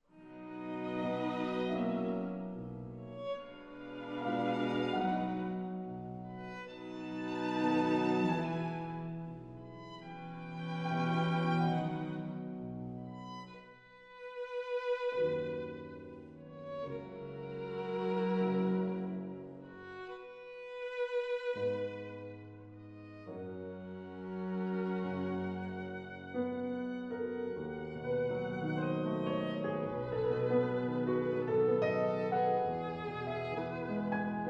Piano quintet; Gernsheim

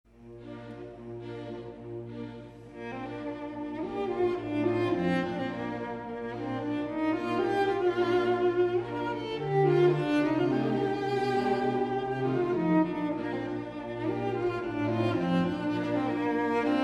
Serenade for cello and orchestra; Rimsky-Korsakov

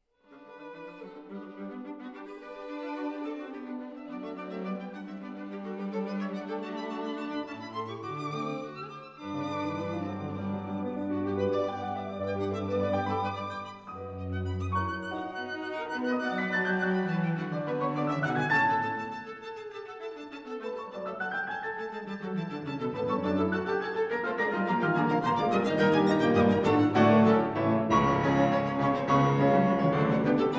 Piano Quintet; Gernsheim

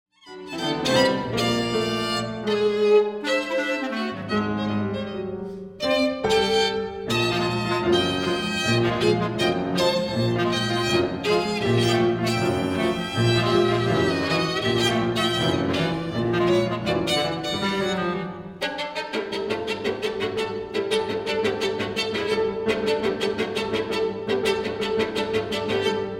Tango Valsas Regtaimas; Stravinsky

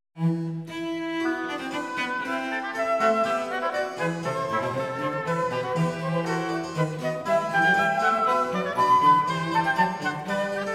Trio sonata E; Handel

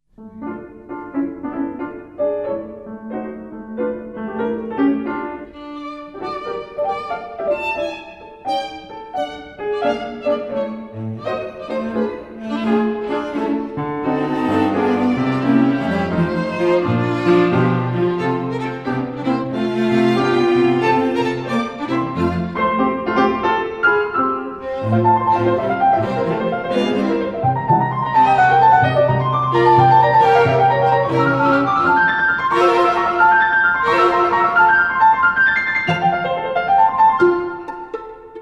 Piano Quintet; Reinecke

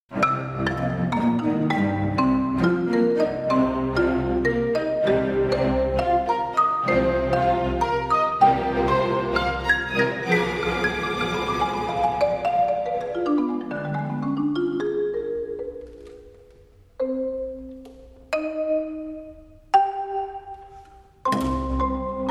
Concerto for marimba; Rosauro

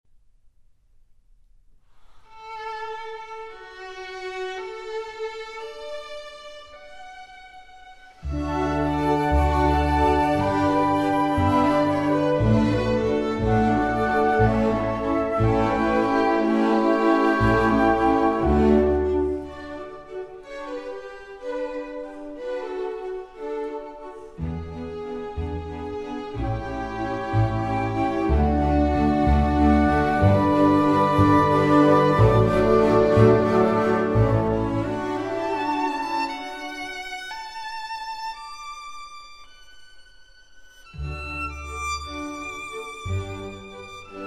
Concerto No. 3 in G for Violin and Orchestra; Henkel

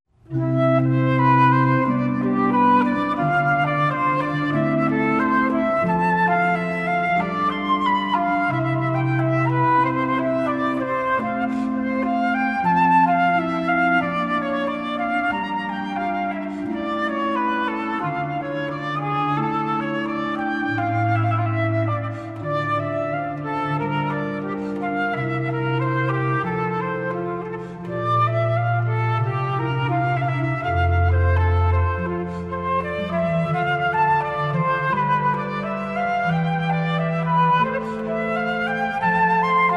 Sonata for Flute and Organ in C major; Bach

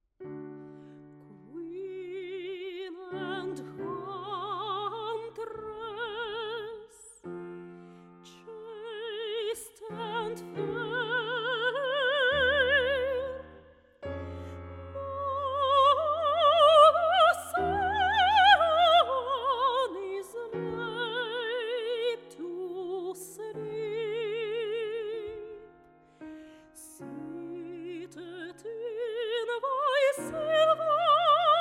Hymn

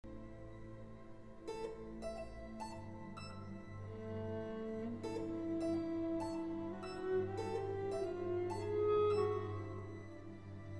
Concerto for viola; Barkauskas

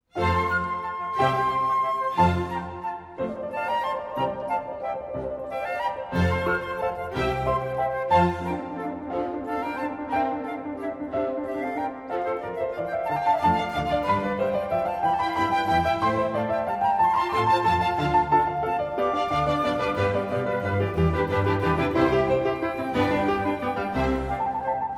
Solo Concertos, Keyboard; Bach; Sondeckis

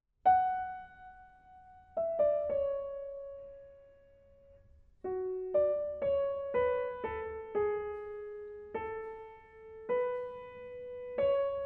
Fuga fis-moll; Vainiunaite; Ciurlionis

